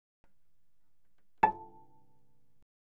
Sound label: Cello pizz mf sul A A5; Strings